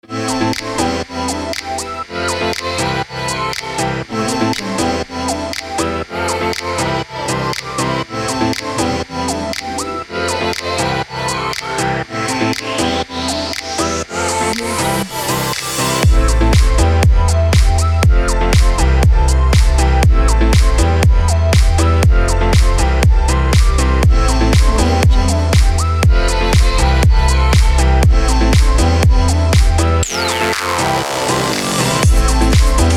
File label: energetic summer upbeat uplifting pop